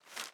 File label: ambience room shadow room working layer